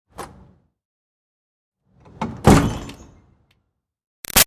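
Door Metal Commercial Pull exterior Hard open-close; Metal Doors; BigRoomSound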